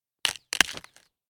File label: crack wood; Zomboid 41.78.16; Project Zomboid